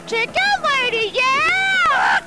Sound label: chicken; Jenny McCarthy; "Chicken Lady. Yeah!"